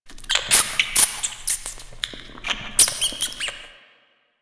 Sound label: Amb Misc Subway Mice; Warframe; NineteenNinetyNine